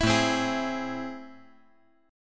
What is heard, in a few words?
A Minor Major 7th A, chord